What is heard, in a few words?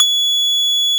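Steel Acoustic Guitar C6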